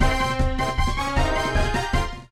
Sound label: Short Attack